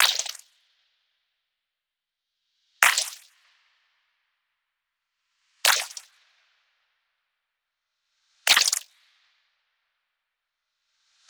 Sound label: snare shatterclap kit; Polyend; Recue